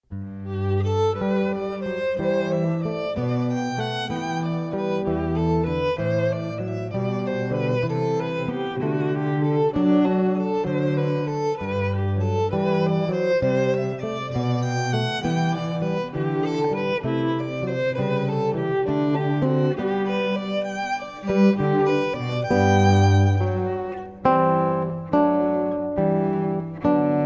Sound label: Jesu, Joy of Man's Desiring, Tri, guitar violin cello